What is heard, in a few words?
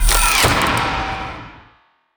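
attack big bow cast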